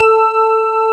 DIDI, SYNTHESIZER, DIGI WAVE; AKAI S6000 CD-ROM - Volume 1

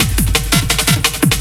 loop, 170 BPM, drum & bass

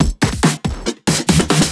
Fills, Loops 140 BPM

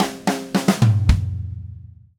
Red Maple Groove 110, DW Red Maple Kit

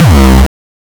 909 Dist Reso Crushed - C2, Kicks; Drums; Mbase 11 Kicks

